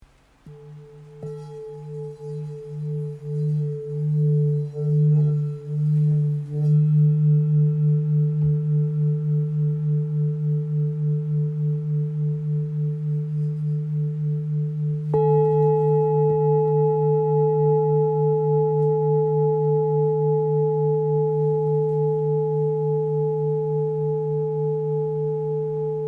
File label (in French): Bol Full moon re 1.09kg